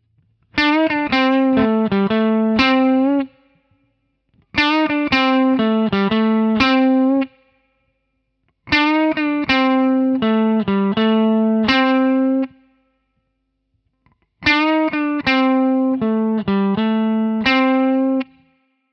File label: Lick, guitar